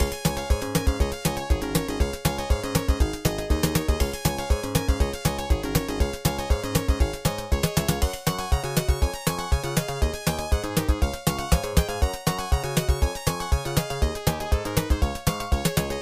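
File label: background music; Block Block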